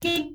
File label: Horn